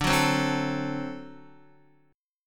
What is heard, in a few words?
D 7th, Guitar; 4F,4A,5C,5G,5C,6E; strummed; JGuitar